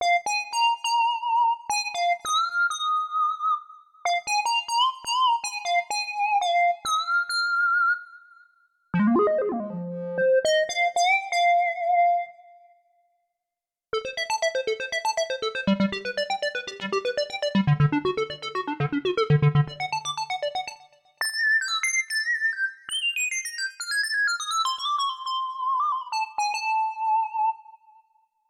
Square Lead, Korg Radias-R

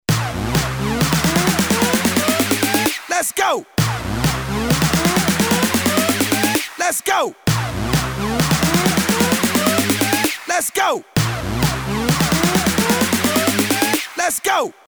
EDM

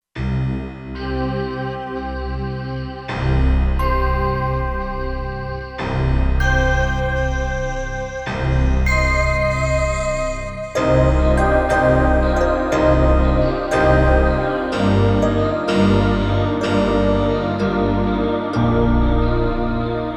Dual Synth, Kurzweil K2500X

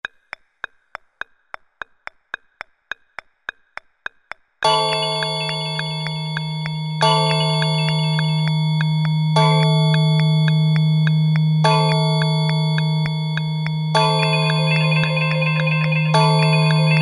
Clock, Yamaha TG500

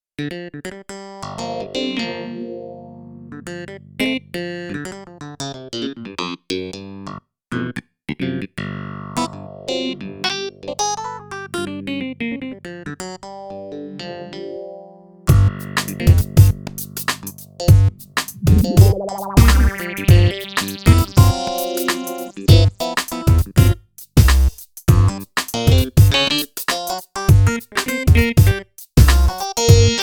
Bright Clav, Korg Radias-R

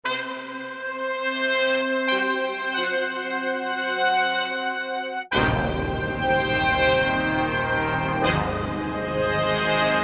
Big Brass; Optical Media International Sonic Images Library

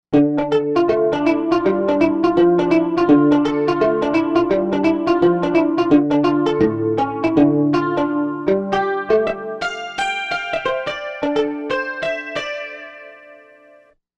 Pick Guitar, Korg M1Rex; Factory Patches

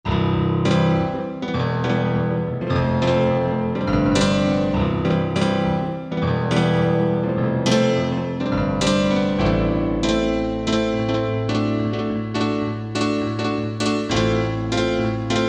PianoForty, Korg MSC-11S Brass

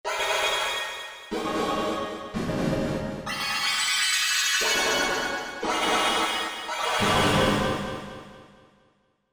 Magic Man, Korg MSC-1S; Korg MSC-1S Variety

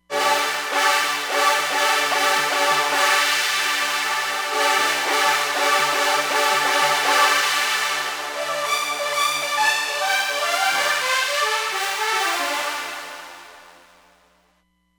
Steamed Sawz, Roland Fantom XR

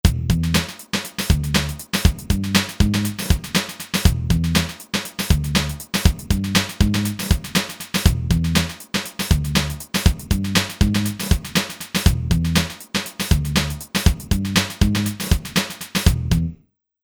Rap Hip Hop

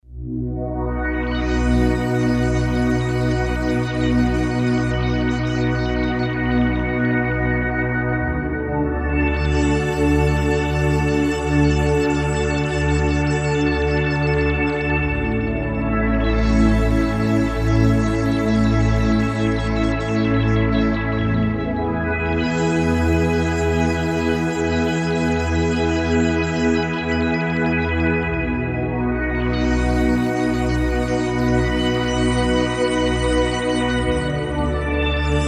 Space Station

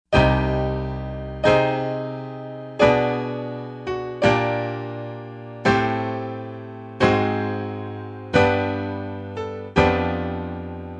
ClassPiano, Yamaha TG55; Factory Presets

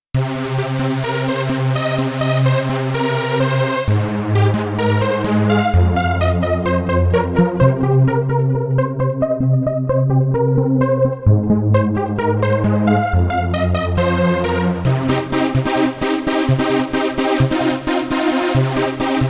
Euphonic Lead, Korg Radias-R

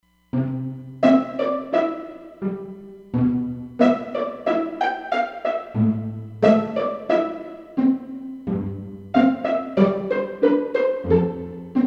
Orch Pizz, Roland Fantom XR

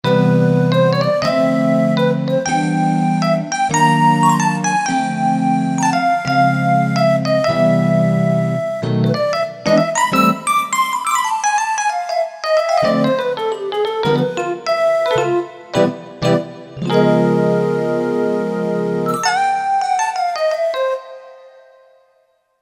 Dyno EP Lead, Kurzweil K2000; Kurzweil K2000 Contemporary ROM

